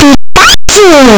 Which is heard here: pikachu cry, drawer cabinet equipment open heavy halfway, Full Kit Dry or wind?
pikachu cry